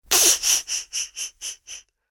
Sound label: Sneaky Villian Laughter, evil laugh